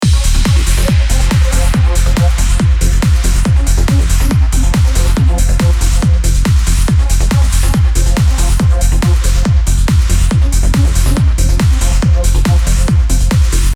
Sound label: classic trance kick bass; Ableton Live; AM Studios; trance music; template